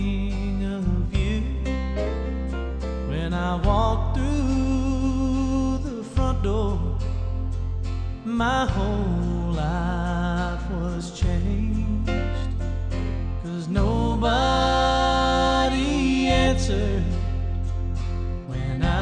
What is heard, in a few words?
country